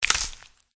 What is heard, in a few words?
crats attack contact up